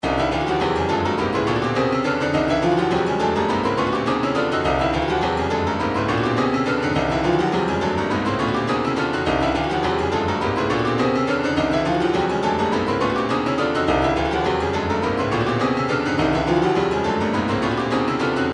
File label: retro funk 104 16ths, midi; hammerhead